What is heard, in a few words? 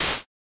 walk